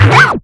Scratching FX

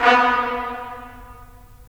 BRS, Tps Stacc; Roland - LA Composer vol. 4

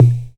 Loop, Drum Machines, Teac Rhythm-8; Teac Rhythm-8 Ableton Project; Processed

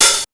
HH OPEN; Big Beat Samples & More; AKAI

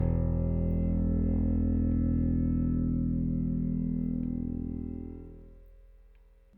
Rick Thumb A, Rickenbacker Bass; Airwindows

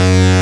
JUP8 PULSE; E-MU Formula 4000 Series Vol. 2

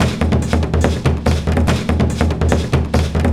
African Ens, Loops & Phrases; Roland BK-5

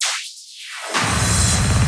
Fills 128BPM, Clubsounds

